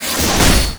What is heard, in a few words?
Hash Strike; MUDevs S20.1-3